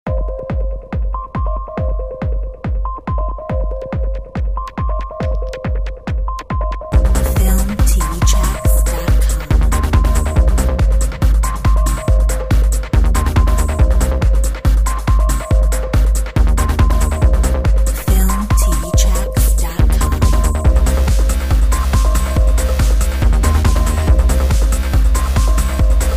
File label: Techno trip